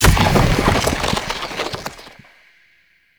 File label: Fire Rain Hit; Powers; Fenn